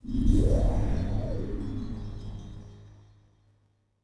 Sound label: force Powered Up